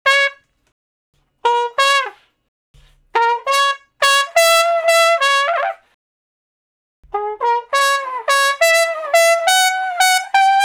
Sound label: Trumpet Slo Blus (Db I, IV, I, I); Solos